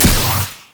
heat seeking; LoL; battlecasturgot

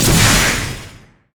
guardian spell attack; odin

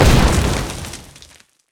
pirate cannon barrage boom; LoL; gangplank

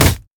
crit attack; Dr. Mundo

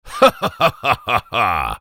laugh

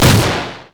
buster shot; rocket tristana

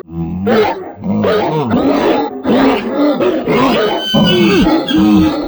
emote ult laugh; nasus skin05

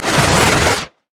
surging tides; nami base

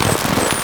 fireworks gatling gun; Fireworks Corki

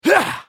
effort; Swain; en US

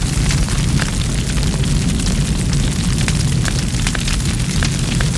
fire; jinx base

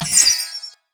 frostshot; LoL; ashe skin06

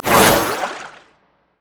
launch; LoL; syndra

